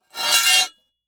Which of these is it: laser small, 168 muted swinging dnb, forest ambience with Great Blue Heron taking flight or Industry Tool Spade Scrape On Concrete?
Industry Tool Spade Scrape On Concrete